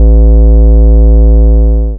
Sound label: sub bass